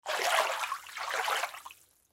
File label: Splash Bathtub, Water Splash Bathtub Small Hand Movement; Bathroom; Blastwave